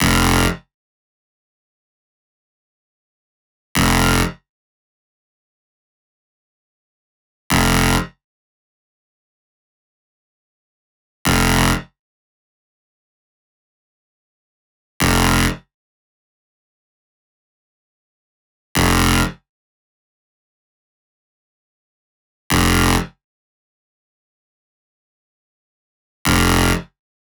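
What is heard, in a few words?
128BPM Saw Add NoSC, Bassline, F minor; Vengeance - Essential Clubsounds Vol.5; Mainstage Kit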